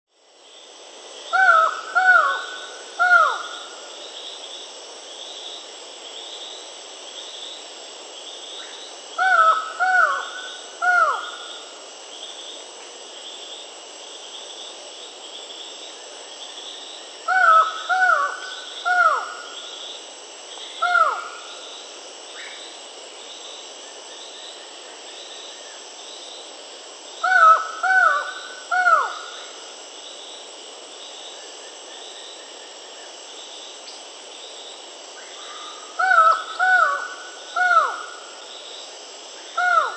Buckley's Forest-Falcon (Micrastur buckleyi), Peru; S. America